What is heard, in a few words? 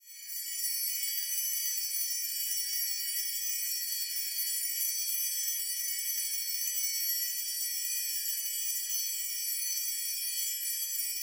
grains chimes